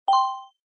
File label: level; plumber